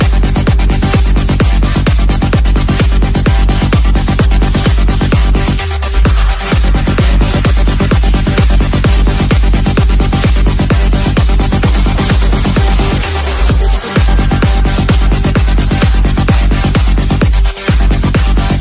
dj music remix malayalam ringtone

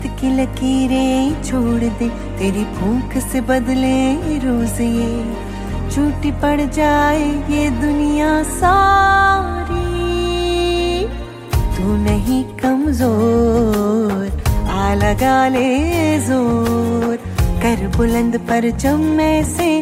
drama ringtone; sinf e aahan; ary digital

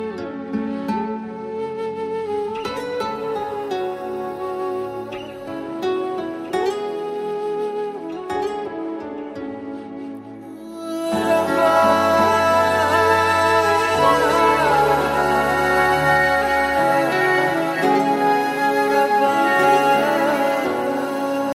hindi music ringtone

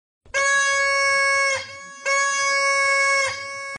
loud mobile alarm ringtone

